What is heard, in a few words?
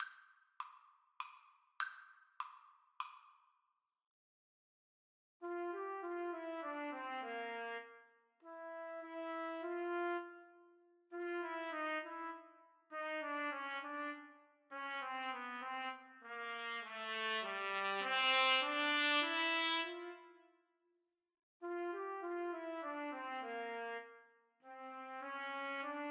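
la virtuosa trumpet duet karaoke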